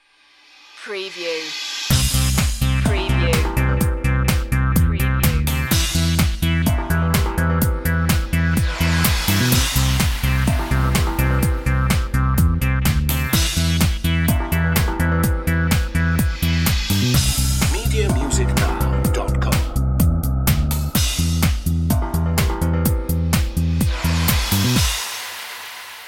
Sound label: monks pulse